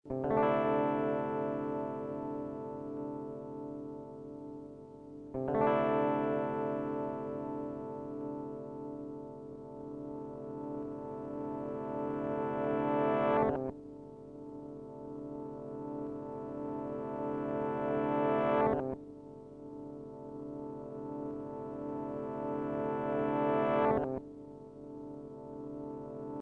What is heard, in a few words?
chord reverse